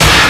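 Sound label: STACK BRASS, Ragga & Dub Reggae; RAGSTACKS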